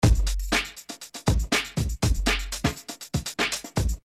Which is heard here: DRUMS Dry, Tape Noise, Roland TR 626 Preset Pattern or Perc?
DRUMS Dry